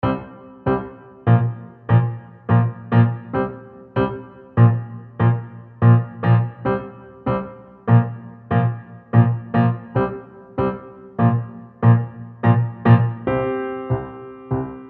EZkeys Studio Grand UK Pop Warm and Saturated

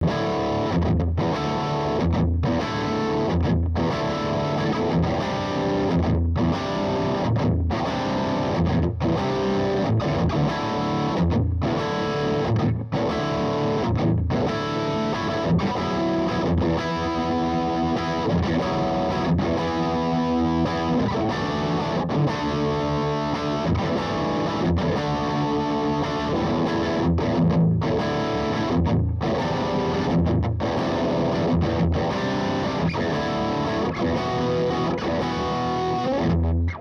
heavy

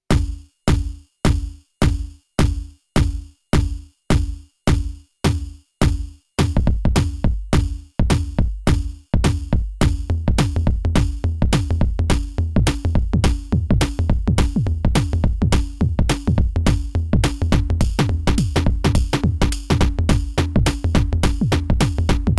Erica Synths LXR 02 Pattern Generator; Sonic Potions

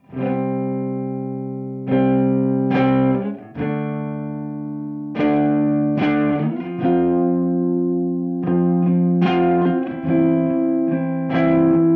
Beetronics Seabee; Factory Preset

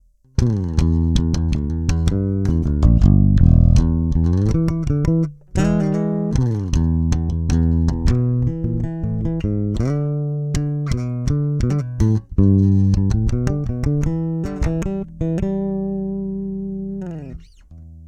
Epiphone Flying V Mod Bridge

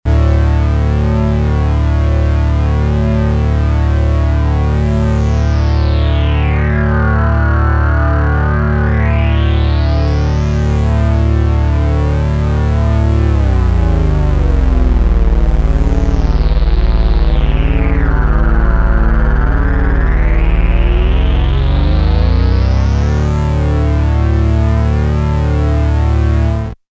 Analog Pad

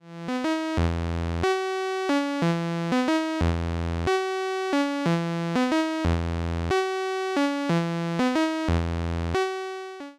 Behringer 2600 blue 1VCO dry

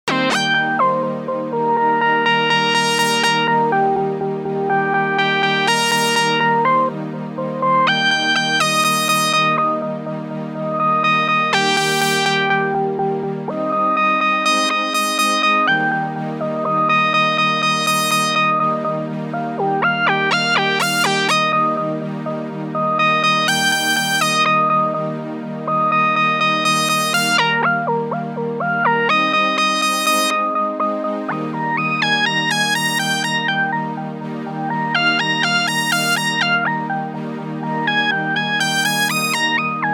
Porta Queen Lead Strummed Back Pad Dry 2 Wet

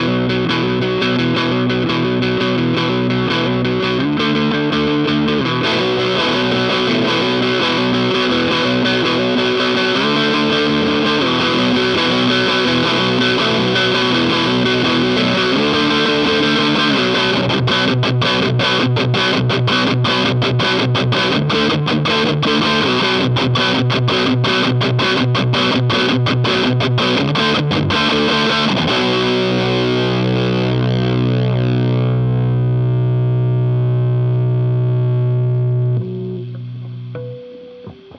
Boss OD 200 Tubescreamer

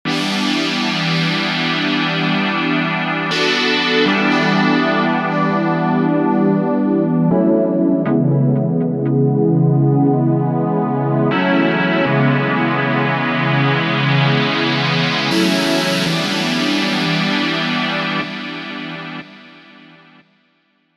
Pad Multi Hard Knee

